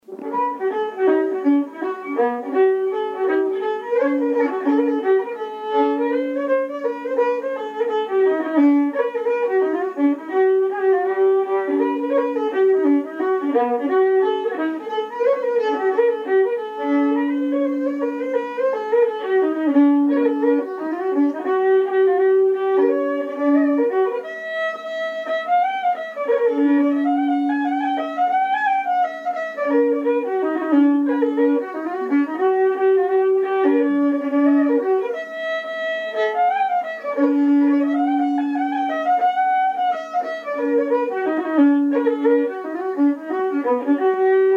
hornpipe; Stack of Wheat; OMahonys

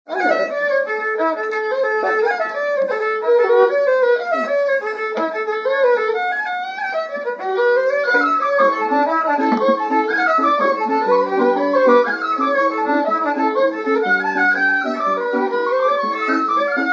jig fragment; Langstroms pony

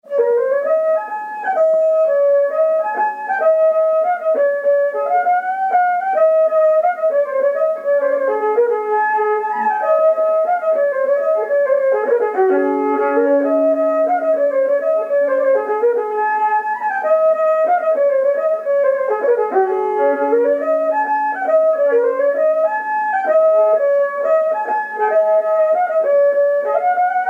Slides Kerry slides

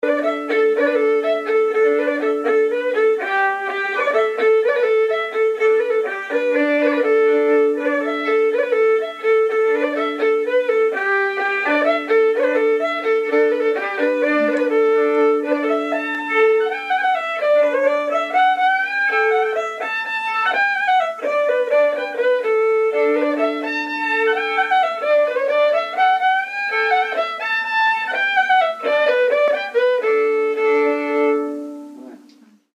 Tune the fiddle; Polkas pitched

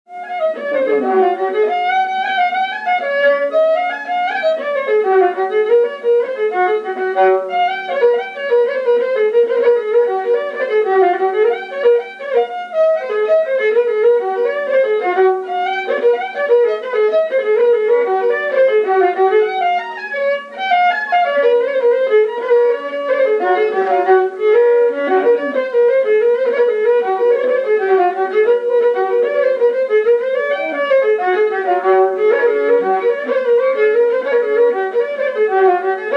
jig; Humours of Ennistymon

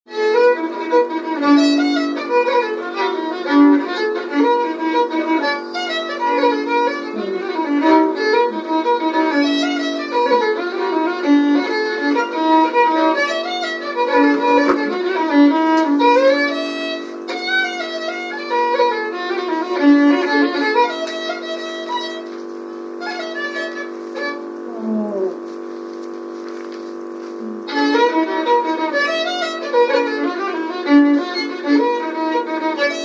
jig, B part missing notes; The Killavil

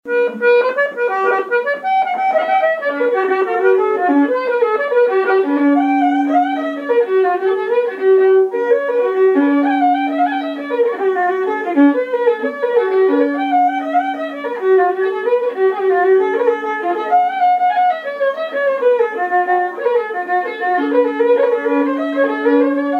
jig; The Fishermans lilt

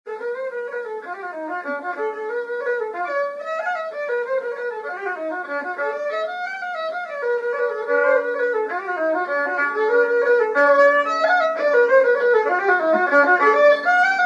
jig; Shores of Loch Gowna

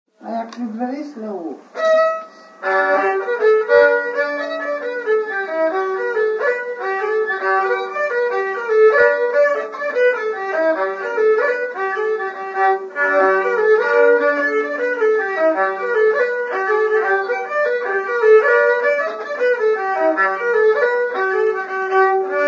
jig; Punch for the Ladies or Shandon Bells

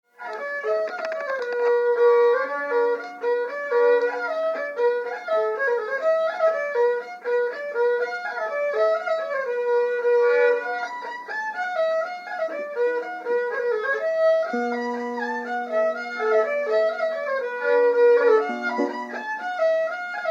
polka; John OLearys aka OKeefes

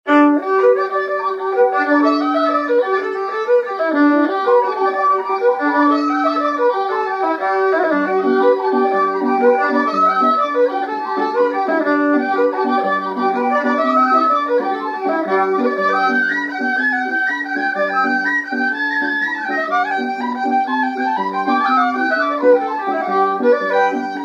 jigs; Fishermans; Paddy Lyons

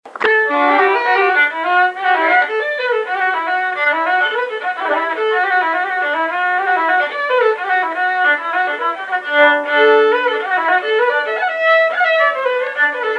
reels; Callaghans No 1; Glentaun; Callaghans No 2

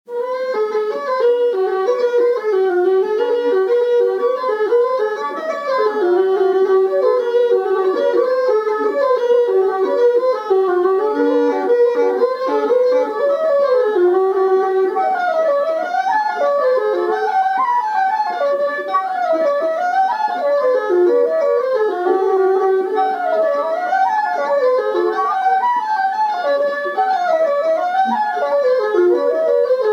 jig; Queen of the rushes

